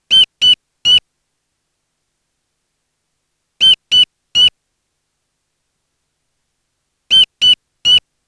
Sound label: Rhioderma rufum call